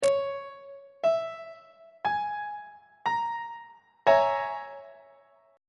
b flat half diminished 7th 1st inversion on treble clef